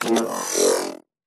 robotic power surge sci fi robotic robot cyber futuristic energy machine drone